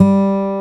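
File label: G 2 MARTIN, acoustic guitar; AKAI S6000 CD-ROM - Volume 1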